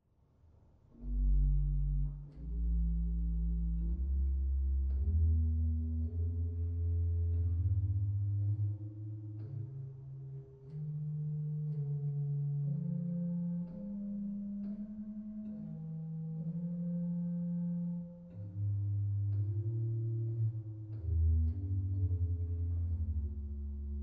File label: Quinte 10 2/3, Sauer; dortmund